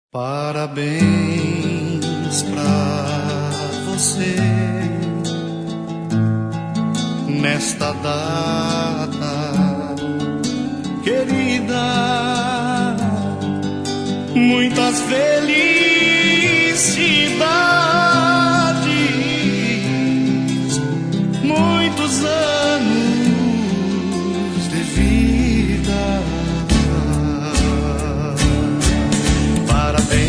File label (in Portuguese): Aniversario